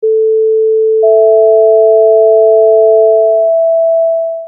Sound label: sine 440 660 equal